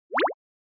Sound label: Anime Motion High